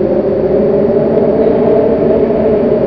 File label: WIND MOAN